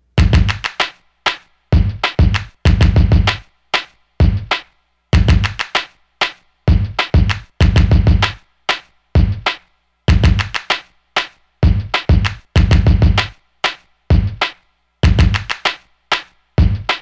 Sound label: old school beat interlude